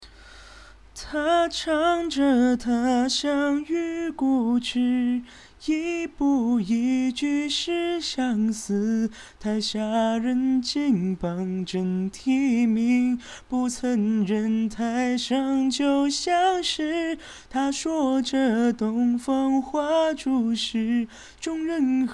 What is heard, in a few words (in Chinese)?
清唱